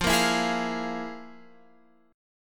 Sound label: F Minor Major 7th Double Flat 5th, Guitar, Open F; FmM7bb5 strummed; x,12,10,11,11,12